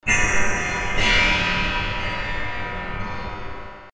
metal

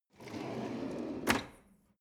drawer medium close draw

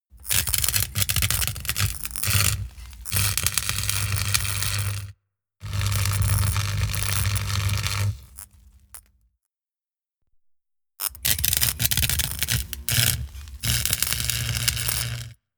spark

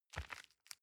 envelope grab soft

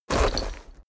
horse step saddle

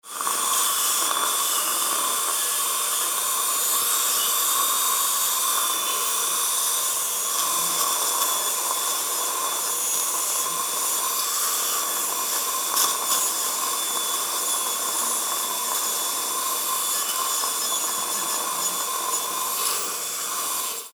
aspirator and drill

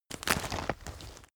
rotten wood crack

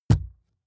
wood impact hollow large dry

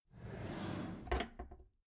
packed drawer smooth clatter slide open

